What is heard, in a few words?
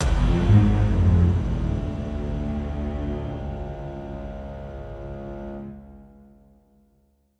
Lose